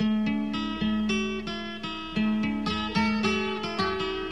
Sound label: guitar, Sad